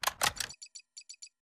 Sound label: system load; EMXArms